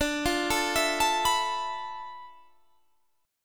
D Minor 6th Add 9th D, Guitar, Standard; Dm6add9 arpeggiated; JGuitar

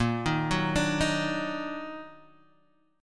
Eb Minor Major 7th Bb, chord

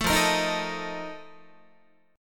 F 11th F, down, Guitar; F11 strummed

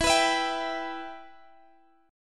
E Minor E, Guitar, Standard; Em strummed; x,x,14,16,17,15; JGuitar

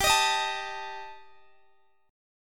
G Suspended 2nd Sharp 5th, Guitar DADGAD; Gsus2#5; strummed; x,x,17,20,18,19

